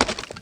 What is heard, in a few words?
axe mining; funneltrunk; Factorio